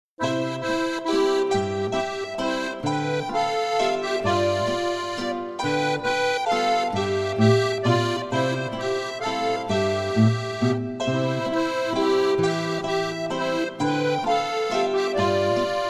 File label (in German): walzerl